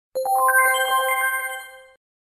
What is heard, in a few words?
Txt Message